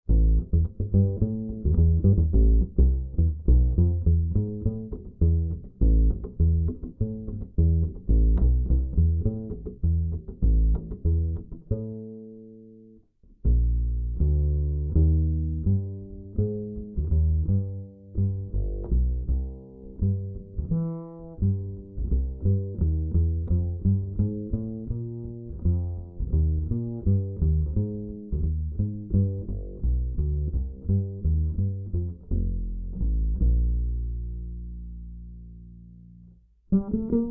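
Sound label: acoustic bass Plucked direct input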